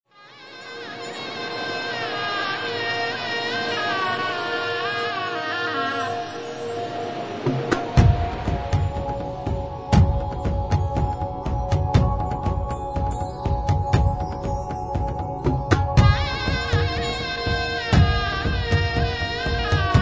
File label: Tantra Drums; art of love; Native